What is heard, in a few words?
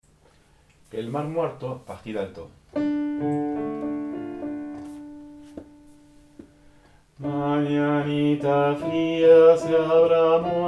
Alto